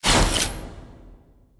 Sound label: ice activate; smite; god merlin